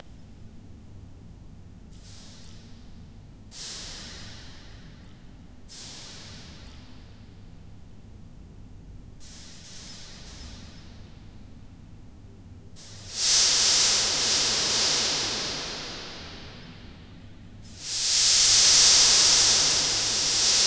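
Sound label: grand throne hall ambience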